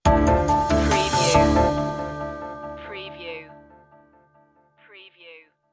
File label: mind matrix stinger